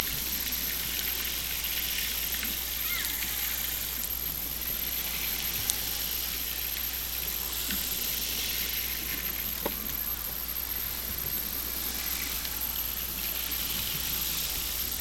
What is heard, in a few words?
Hydrophones, orcasound